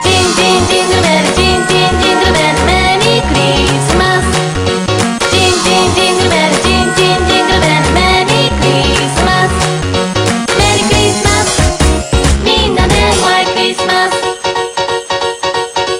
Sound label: jin jin jingle bells; children